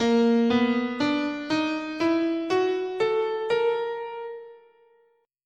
A# Persian

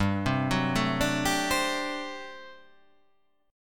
G Major 7th Suspended 2nd Suspended 4th G down

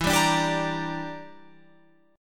E Minor 7th Flat 5th, Guitar, Open F; Em7b5; strummed

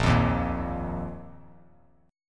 A sharp Suspended 4th Bass Standard; strummed; A#sus4; JGuitar

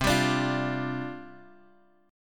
C Suspended 2nd Suspended 4th C Bass Standard; strummed; 20,22,24,22; JGuitar

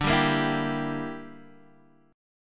G Minor 6th D

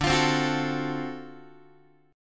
D 7th Sharp 9th, Guitar; x,10,8,10,10,8; 4A,4E,5A,5D,5G#,6C#; strummed; JGuitar

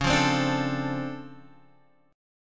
D-Minor 7th C sharp, chord; Dm7/C# Chord {X 4 3 5 3 5} strummed

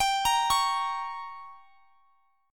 G Diminished; Gdim arpeggiated; x,x,x,24,23,21

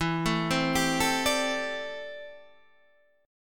D 7th Suspended 2nd Suspended 4th E

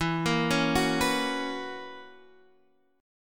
B Major 7th Suspended 2nd Suspended 4th E

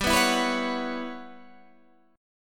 E Minor 6th Add 9th G chord

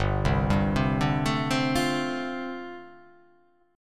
G 7th Suspended 2nd Suspended 4th

